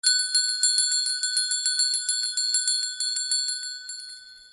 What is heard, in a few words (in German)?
weihnachtsgloeckchen